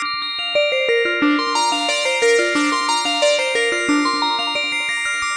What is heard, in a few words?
bright analog arpeggio